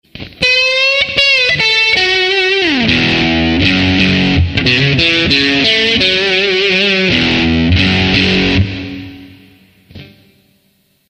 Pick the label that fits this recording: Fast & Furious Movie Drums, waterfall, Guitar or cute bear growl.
Guitar